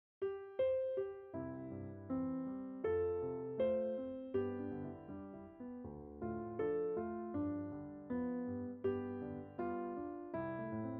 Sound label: EPiano; Vietnamese Arrangements